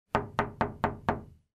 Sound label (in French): bruit de porte